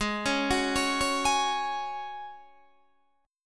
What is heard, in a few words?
G sharp 7th Suspended 4th G sharp, Guitar DADGAD